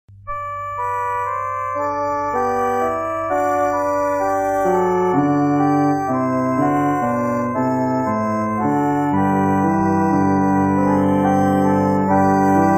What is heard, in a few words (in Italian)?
solo musica